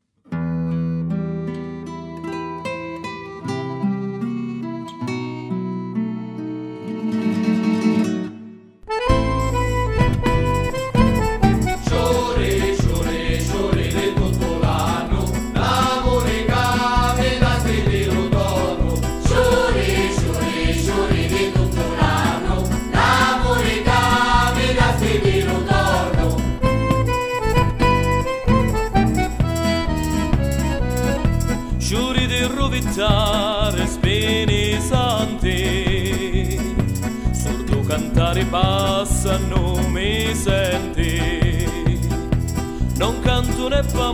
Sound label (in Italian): CIURICIURI